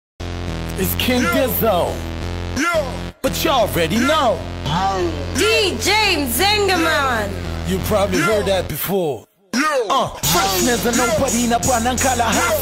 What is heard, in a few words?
Freestyle